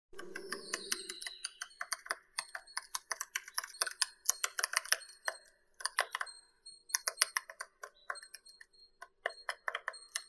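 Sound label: three-toed woodpecker, Picoides tridactylus; dyatel trehpalii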